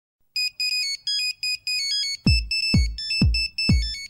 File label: Samba Mono